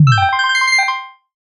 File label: bonus win